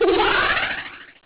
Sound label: zoom